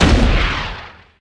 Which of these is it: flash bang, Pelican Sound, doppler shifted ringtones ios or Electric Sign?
flash bang